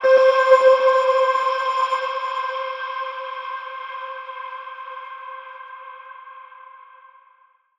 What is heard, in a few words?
tonal oneshot C; Pop; Slate; PASADENA Pop Sample Pack; Tonal One Shots